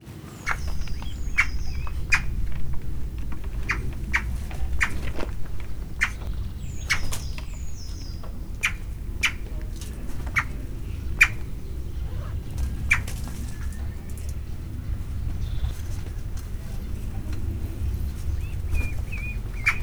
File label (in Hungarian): csipog, szarka; állathangok; miskolci zoo 2009